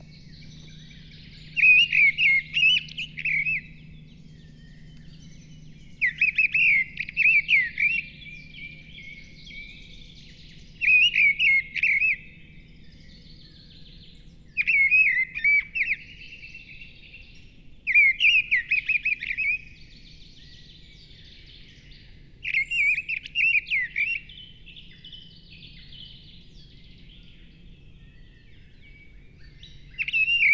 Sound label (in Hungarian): léprigó Turdus viscivorus